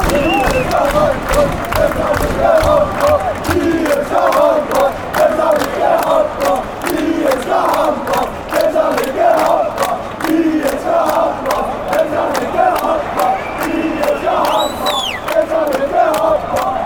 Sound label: chant, fanchants; randers